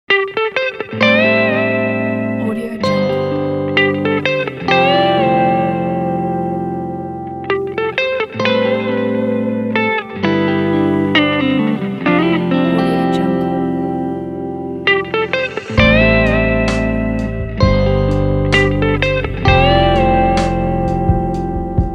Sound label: guitar lovely